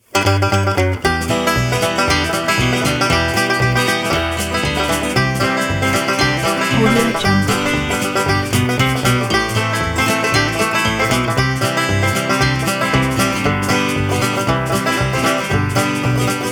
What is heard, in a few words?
upbeat country